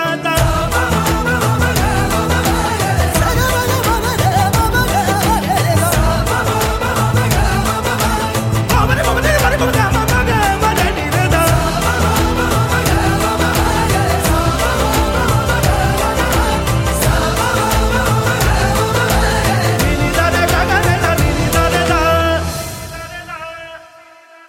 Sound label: Classical